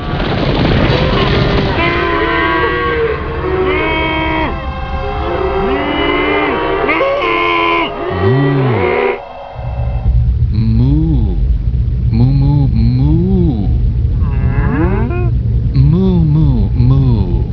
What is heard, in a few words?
moo